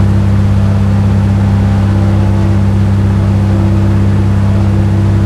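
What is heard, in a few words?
zlin cockpit left, engines; War Thunder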